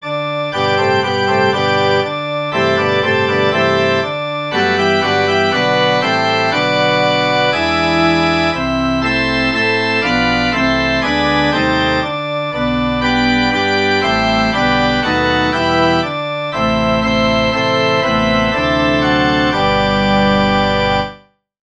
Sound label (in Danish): forspil; spnd over os dit himmelsejl